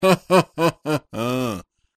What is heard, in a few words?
risa forzada Forced laugh